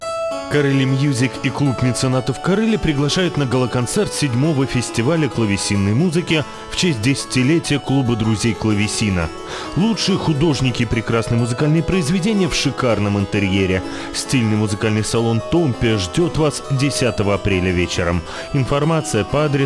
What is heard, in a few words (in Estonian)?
klavessiin; corelli